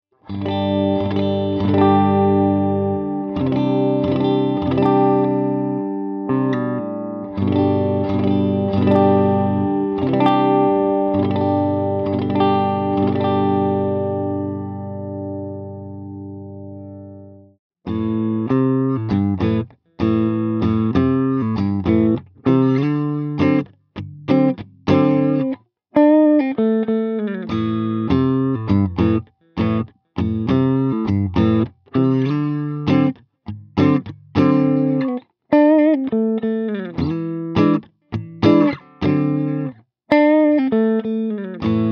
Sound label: middle clean single, pickups